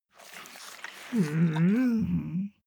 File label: dog whine low sigh; natur-tiere; hunde